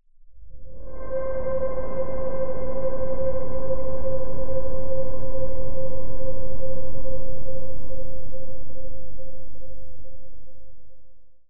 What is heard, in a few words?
Pad, Sylenth1; Routed Sound; Sylenth1 Expansion - Doom Carpenter Vol.1